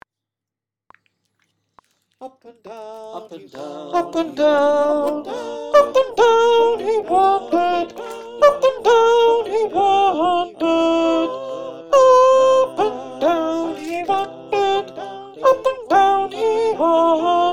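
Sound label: Alto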